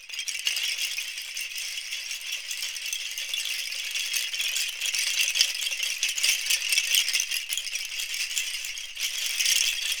BAMBOO, Percussion; ILIO; Synclavier Percussion 2; World & Orchestral

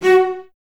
Cellos, String Ensembles; F#4; Miroslav Vitous; LINKS ORCH

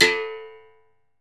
STRG; Berimbau Pallet

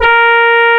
A#, Synclav FM, BRASS; Big Fish Audio

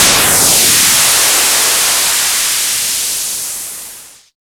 FX HIT, Techno Trance; Ueberschall

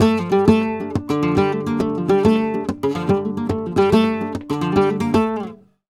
Flamenco Guitar Remates De Pulgar, d

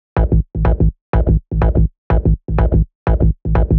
124 Am Retro Vision, Melodic Loops; Electronisounds

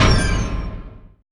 JUNK, Impacts, Hits & Booms; Distored Reality

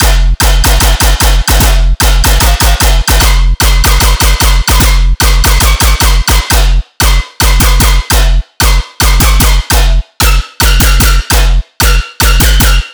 tearout thingy, bass

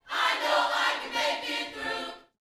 Vocal, Gospel, PHRASE; Spectrasonics

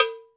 HR16 COWBEL; Crypton - DrumBase5000

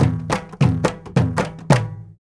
India, Heart of Asia

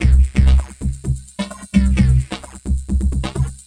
Cylon Beats (130BPM); sci-fi

